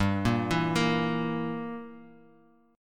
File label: E Diminished G